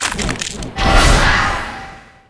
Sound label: Spider Atk